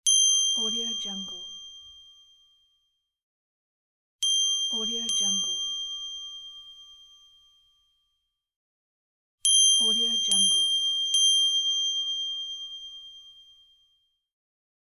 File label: magic bell